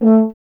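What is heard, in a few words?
brass French horn; Miroslav Vitous; Solo Instruments VOL-2; Solo lnst. II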